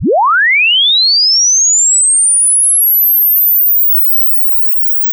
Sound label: sweep sin 20 20k